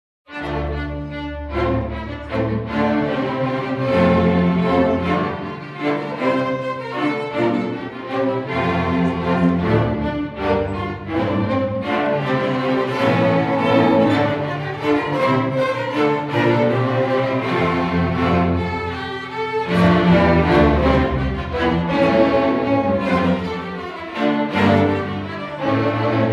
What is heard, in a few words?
Basse Danse